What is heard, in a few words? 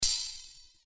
Sword Sound; FXHome; Fighting Sounds